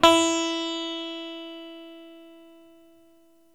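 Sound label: SITAR E 3 left, coral sitar, guitar; East West; Steve Stevens Guitar Samples Collection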